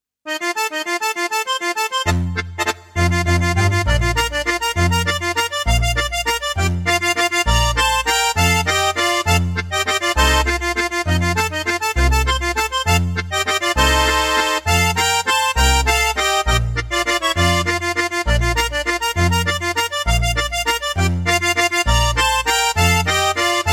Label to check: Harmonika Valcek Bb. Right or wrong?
Right